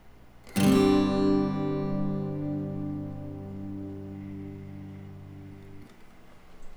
Gsus4, guitar

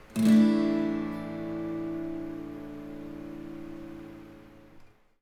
Asus4, guitar

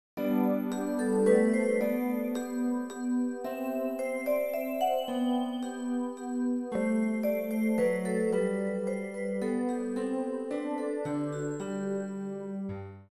menuet

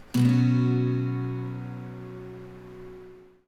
Badd9, guitar

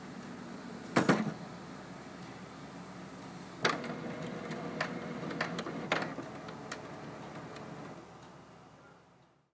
printer

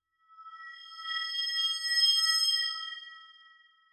ghost

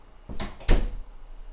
doorknob

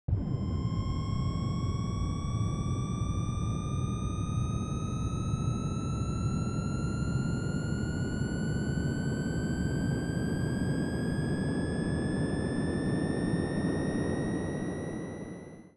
jetplane

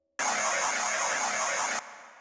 noises

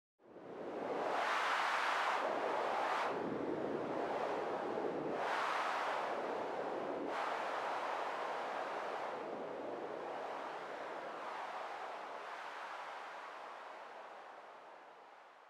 wind

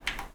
click

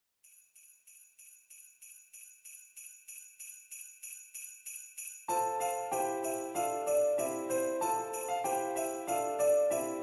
jingle karaoke; Christmas; Classic

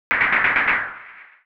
gun

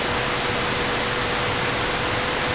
noise

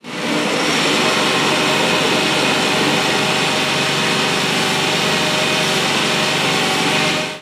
kouji

